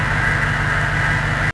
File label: van idle garage; Max Payne